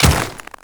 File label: Ice Step; Enemies; Footsteps; Warframe; Grineer Royal Guard